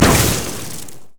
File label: grasping roots; Zyra; hellfire